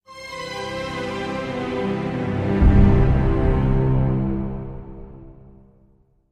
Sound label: Orchestral Msx Logo